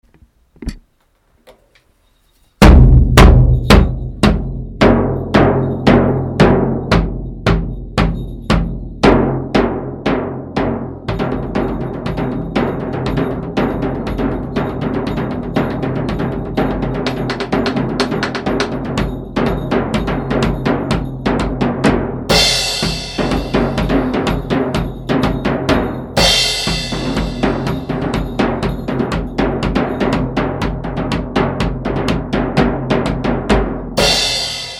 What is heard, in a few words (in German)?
Sound Trommel Davul